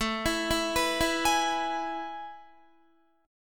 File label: A Major 7th Suspended 2nd, Guitar; AM7sus2 arpeggiated; JGuitar